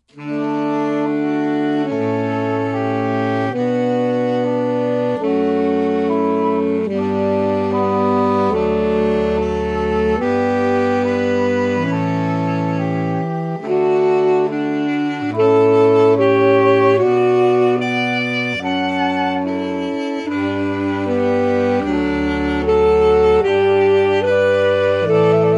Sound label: canon in d